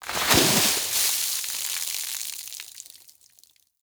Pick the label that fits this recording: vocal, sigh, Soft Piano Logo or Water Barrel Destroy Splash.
Water Barrel Destroy Splash